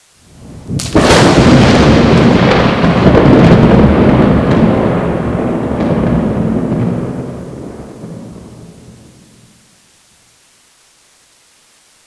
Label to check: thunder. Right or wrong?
Right